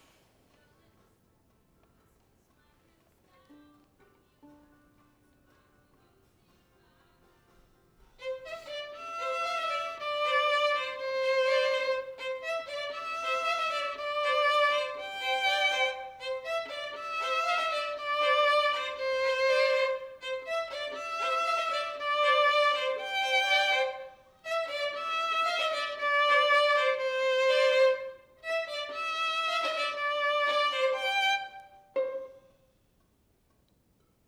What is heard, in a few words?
Violin